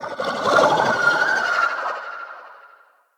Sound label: Corrupted Slug Vocal Death